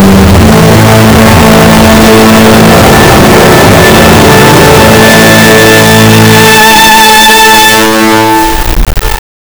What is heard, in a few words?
structure dynamics intro, build up